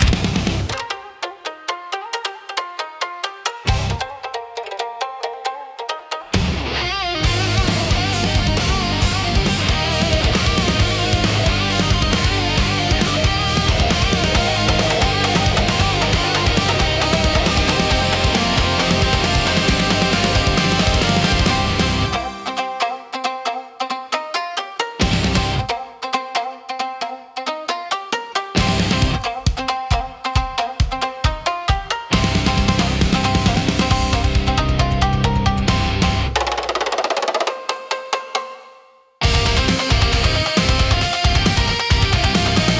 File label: Splintered Strings, intense japanese rock